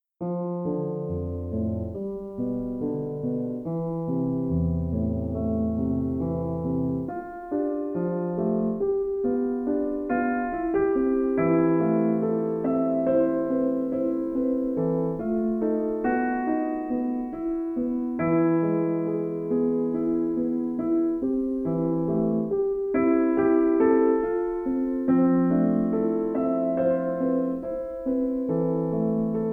Relaxing Piano Music, Classic Piano, Easy Listening Piano